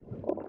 Hunger

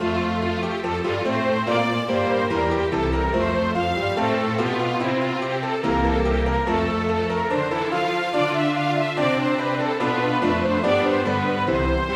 Slow

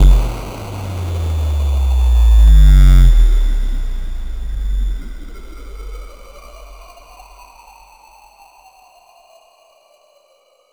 Fx Impact Rewind; bass house

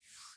Hand Move

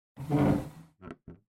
Chair

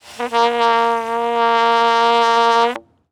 Bugle; PEAK (2025)

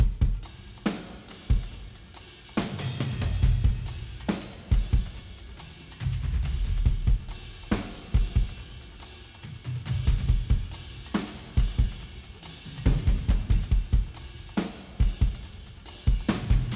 Combat Threat 140 C Sharp m 4 4; Combat Basalt3 Threat4 140 C Sharpm 44 4

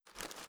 Impact Chipbag

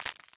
Eat

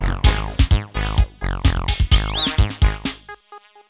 Jingle Game Over; Sunday Lawn

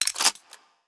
Generig Equip

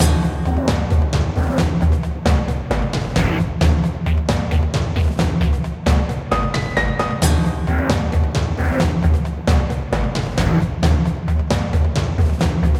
Emotional Bossfight Wut

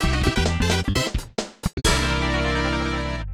Course Clear Theme; Super Mario 64; N64

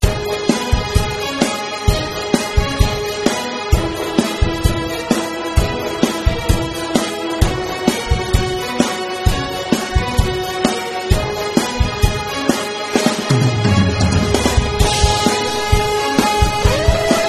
Menu Sound; Grave Defense HD

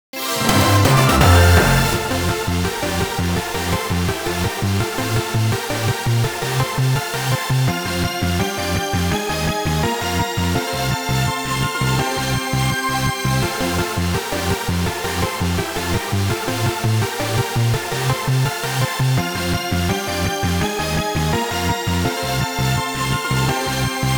Victory!; Pokémon Legends Z-A; Switch 2; Victory! (Trainer)

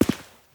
Step Stone

